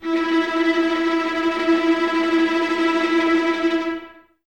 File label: Violas Tremolo E 3, String Ensembles; Miroslav Vitous